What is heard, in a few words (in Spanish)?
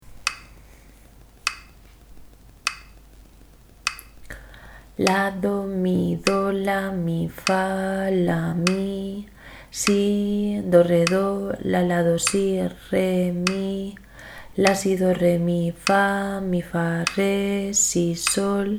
ritmo 6-4